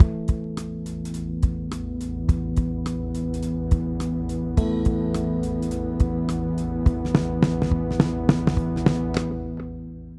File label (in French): jingle sans; fabfilter proq3